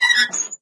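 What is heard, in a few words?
random metal squeak; ambient; Left 4 Dead 2; Source Engine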